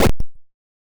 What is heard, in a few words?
die; Egg Hunter